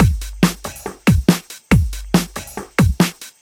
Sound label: Loops BB 140, BreakBeats; Vengeance Samples